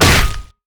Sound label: basic attacks; LoL; vi